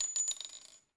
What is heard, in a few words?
Snd 9mm Land2; Red Hot Vengeance